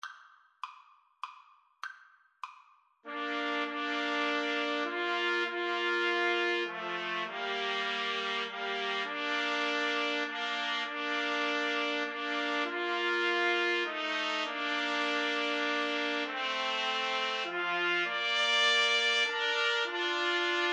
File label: amazing grace, Trumpet Trio